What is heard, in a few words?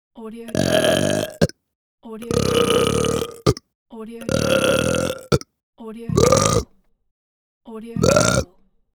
male burp